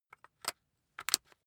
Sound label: rock drill head attach regular; bohrer; soundpool